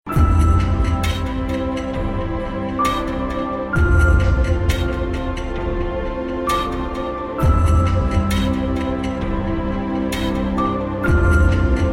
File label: Instrumental